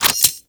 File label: Scoop Idle Detach; Lunaro; Warframe; Missions; Tenno Ball